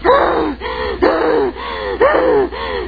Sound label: gasp